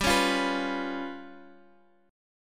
G 7th Flat 5th, G, Guitar, Open D; 17,16,15,17,x,x; down

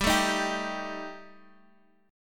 G Suspended 2nd Sharp 5th G, Guitar, Open D; chordshape; down

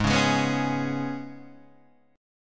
Ab Minor Double Flat 5th down Guitar DADGAD

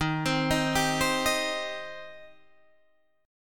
D sharp 6th, D sharp, down, Guitar, Open D

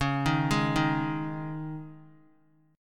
Db Suspended 2nd 3C,3F,3A,3D; arpeggiated; Dbsus2; JGuitar

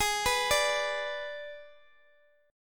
Ab Diminished, Guitar, Drop D; x,x,x,13,12,10

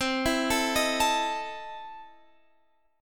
E Major 7th Suspended 4th Sharp 5th, C

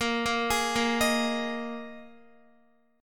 Bb 7th Suspended 4th Bb Guitar 4F,4A,5C,5G,5C,6E; arpeggiated

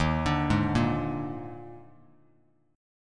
Eb Major 7th Suspended 4th Eb, Bass Standard; arpeggiated; EbM7sus4

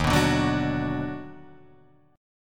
D sharp Minor Major 7th Sharp 5th

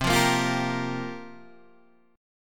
C 7th Suspended 4th, Guitar, DADGAD

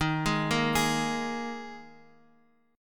D sharp Suspended 4th, Guitar, Open F; D#sus4 arpeggiated; JGuitar

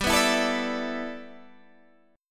G 6th Add 9th G Guitar DADGAD, chord

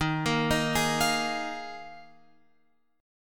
Eb Suspended 2nd Suspended 4th, Guitar, Open F; Ebsus2sus4; arpeggiated

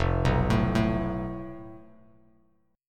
F Major Flat 5th, Bass Standard; FMb5 arpeggiated; JGuitar

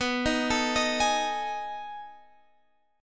B 13th B, chord

